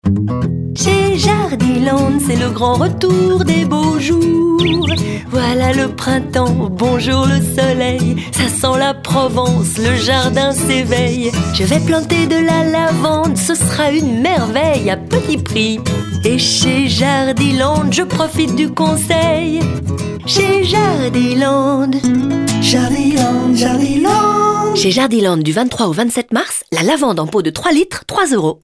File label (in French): chant; jardiland